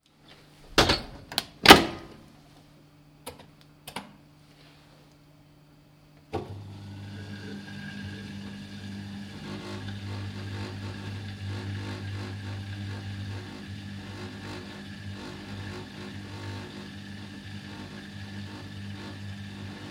Astianpesukone

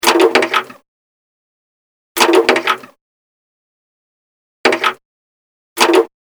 biljardi

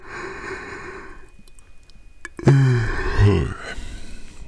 haukotus

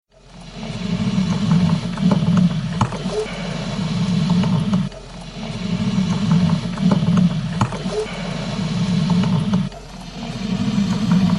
kahvinkeitin

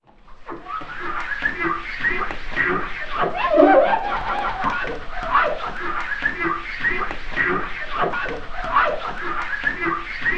hankaaminen

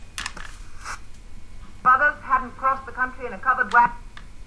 televisio

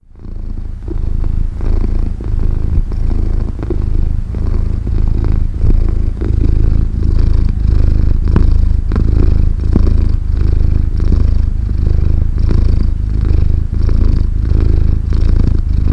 kissa

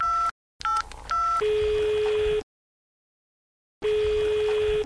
tuuttaus

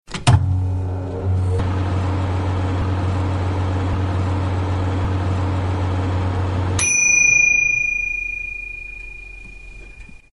mikro

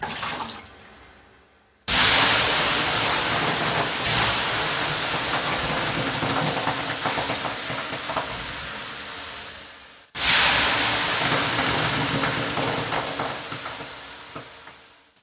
kiuas

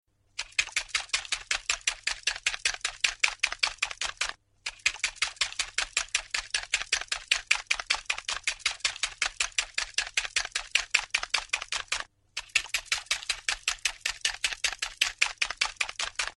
vispaaminen

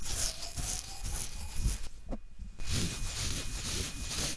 raapiminen

